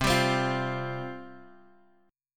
C Suspended 4th C; Csus4 strummed; 20,22,22,22; JGuitar

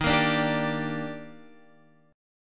D sharp Major 7th, Guitar Open F; D#M7; strummed

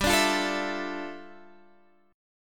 G 5th 13th G, chord

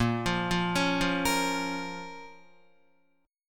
A sharp Minor, Guitar; arpeggiated; 4F,4A,5C,5G,5C,6E; JGuitar

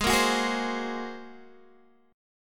A sharp 7th Suspended 2nd Sharp 5th

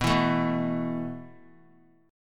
B 7th Suspended 4th B down; B7sus4; strummed; JGuitar

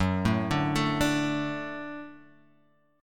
F sharp Minor Sharp 5th, Guitar, Half Step Down

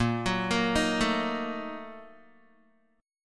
Bb Major 7th Suspended 4th Sharp 5th, Guitar; arpeggiated; JGuitar

